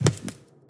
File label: Footstep Crawler, Monsters; Footsteps, Monsters